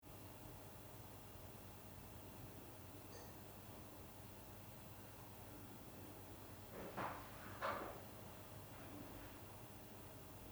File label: room tone quiet hiss; atmosphere